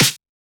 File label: snare complex